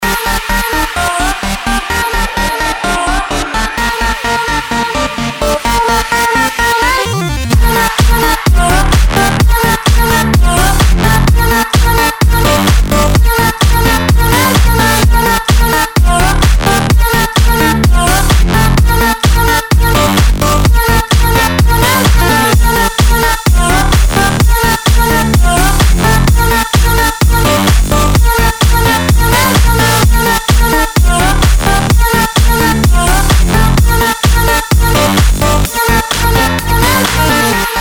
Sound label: Dutch house